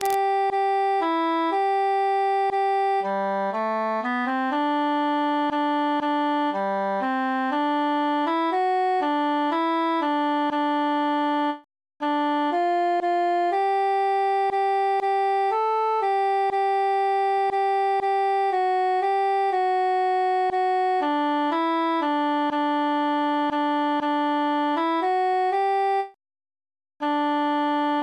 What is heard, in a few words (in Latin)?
altus solo; sing we and chant it